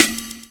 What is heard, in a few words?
Jazz Break